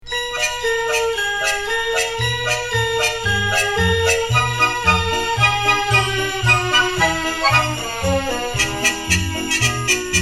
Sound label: Jingle Bells; Special Ed Xmas